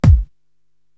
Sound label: BD Junnngle, Korg TR-Rack